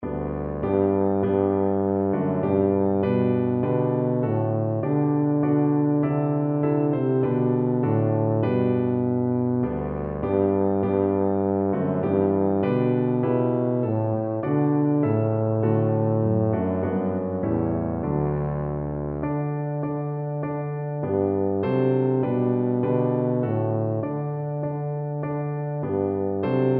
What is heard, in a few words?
hark, tuba